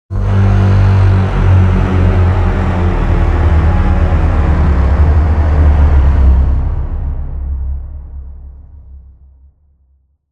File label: Trailer Braam; Production Music; CATastrophic Trailer Tools; Braam 2